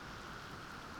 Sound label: Background Noise